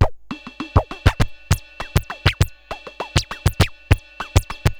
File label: Moog Freqbox RX17 (100BPM), cyborg beats; musicradar